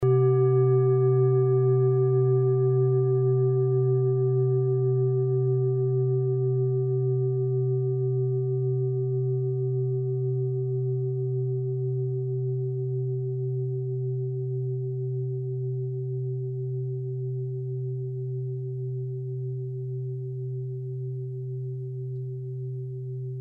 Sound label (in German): klangschale set; Klangschalen-Chakraset; Gewicht = 2020g; Durchmesser = 26,0cm; Höhe = 11,0cm